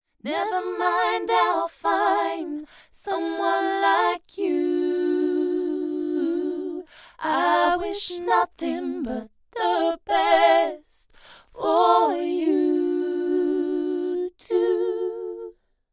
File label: TLM103 vocal double harmony